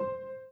Piano Chord

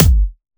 normal hit whistle

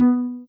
soft hitwhistle; osu

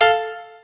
piano

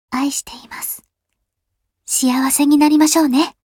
applause

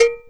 drum hit clap; osu

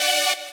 saw

